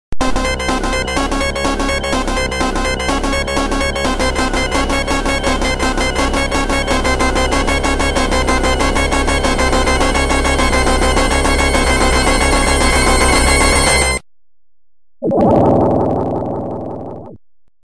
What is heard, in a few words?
drowning; cave